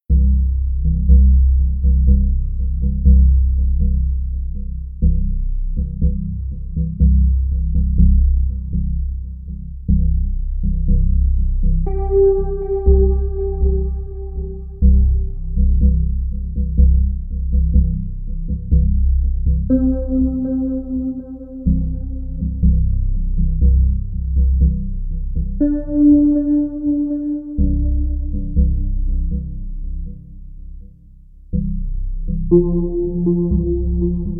Instrumental

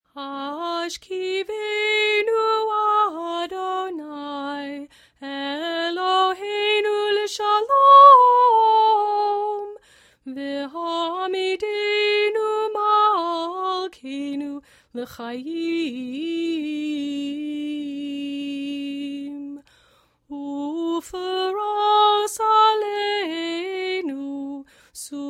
Hashkivanu Chanted

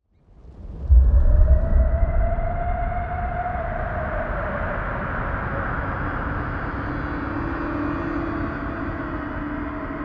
Howling Tempest

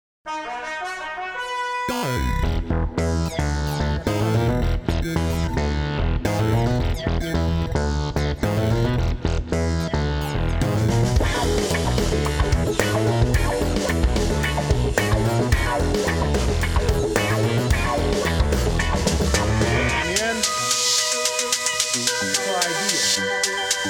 Funk

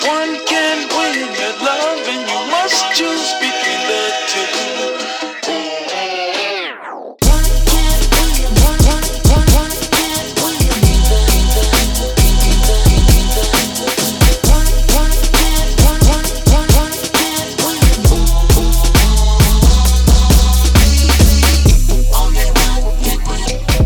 Soul Sample, 133 BPM

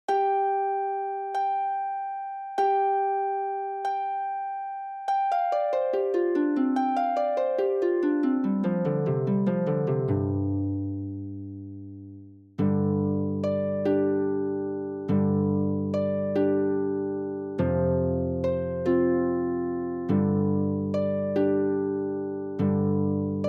Roving Galway Boy Harp; Zephyr Rehearsal Tracks

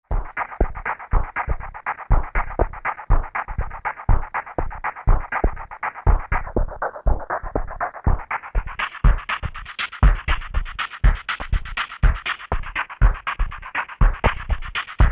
beat session

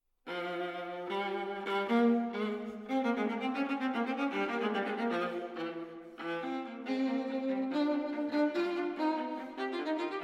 Fugue in F minor, Allegro; EG 114 - Allegro con fuoco

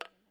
birdhouse with object, pool

